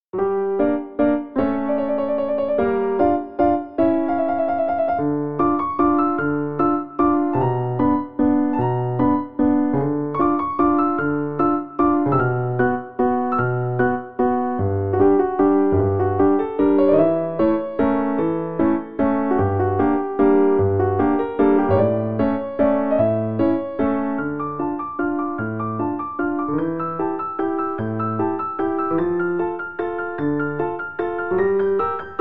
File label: Piano; Mario Party 2